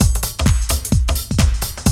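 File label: tribal; AMG - Now CD-ROM (Roland); Megabass 2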